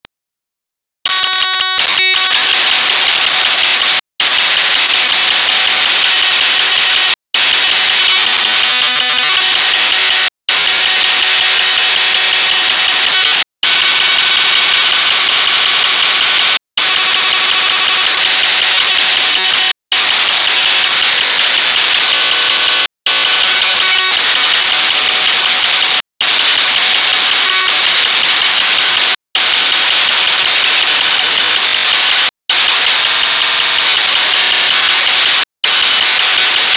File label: stereo reverb